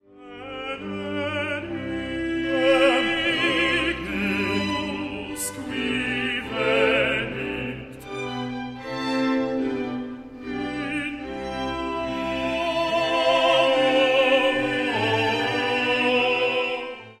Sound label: Tenor Bass; KV49; Benedictus